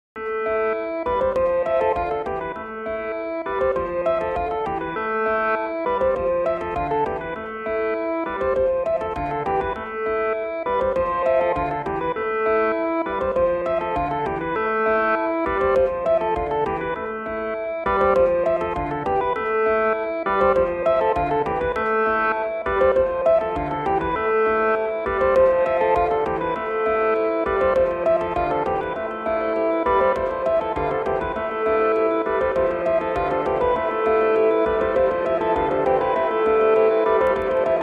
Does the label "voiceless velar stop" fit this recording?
No